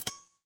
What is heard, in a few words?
feedback achievement